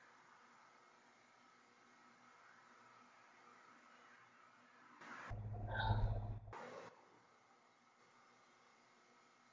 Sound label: Chapel Static; fairfield county infirmary return investigation